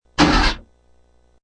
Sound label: Car Ignition Single, Single sound of a car trying to start; Cars; Sound Effects:Vehicles